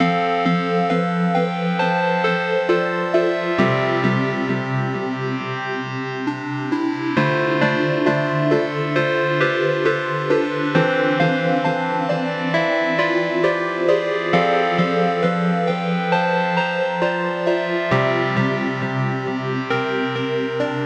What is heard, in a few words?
Forgotten Lullaby Synth Loop